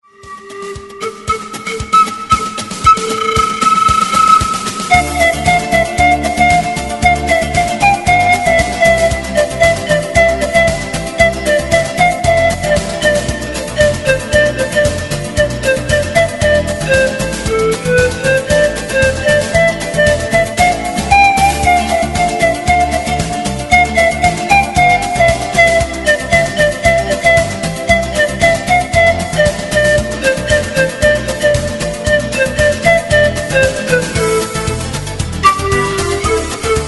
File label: salsa, panflute